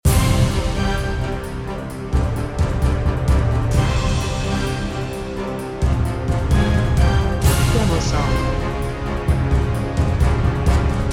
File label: Loop Choir